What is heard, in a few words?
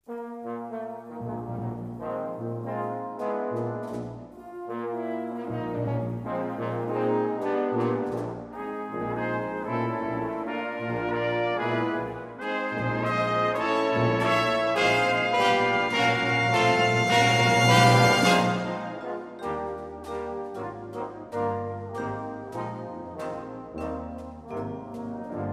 brass